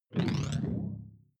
sci-fi alien big bionics; swooshes-swishes

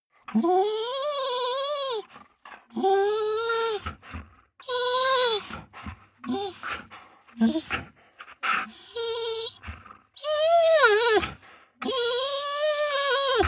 dog whine long; natur-tiere; hunde

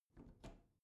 bathroom cabinet open soft slow; objekte; tueren

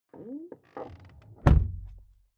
car door close interior hummer h3; Cars

